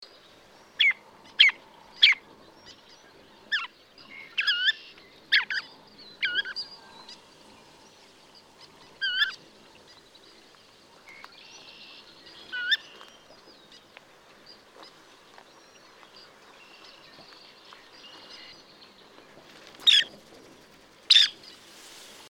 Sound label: Dunlin, Calidris alpina; shorebirds rails